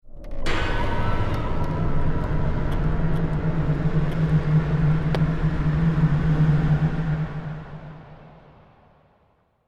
Stinger Decrease Action

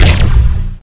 fire laser Rifle

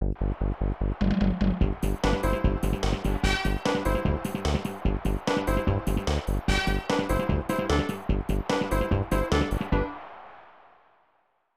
Baseball Jingle; Tecmo Super Baseball 1994 SNES

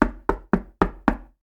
Knocking on Door with Knuckles 5 times; The 39 Steps

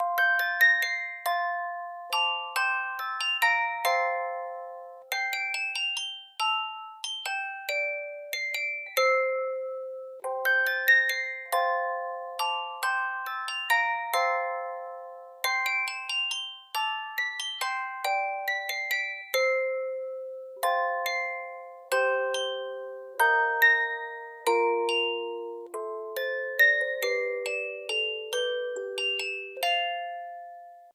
music box; the sims medieval

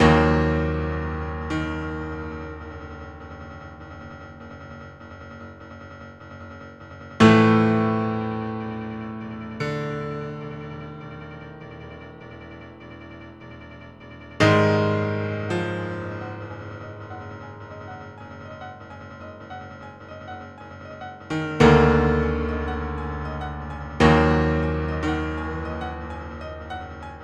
Title Main Menu, Piano; Blackthorne; Blackhawk; DOS

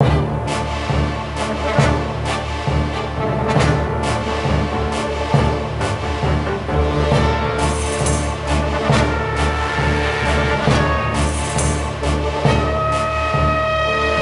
Wizard Battle; Lego Battles 2009 NDS

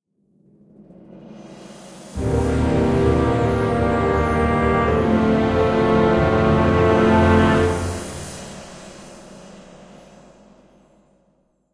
Caught Booze Stinger

